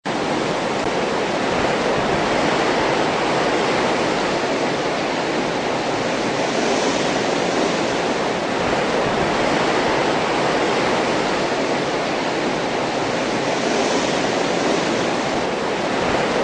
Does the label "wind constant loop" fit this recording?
Yes